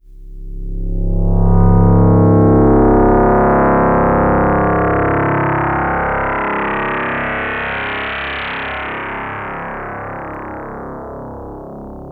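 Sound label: Yamaha DX7 Filter Sweep C1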